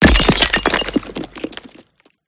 designed impact brick rock dirt gravel designed multi lfe; PMSFX